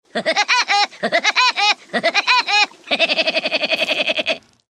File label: Woodpecker Laugh; Woody Woodpecker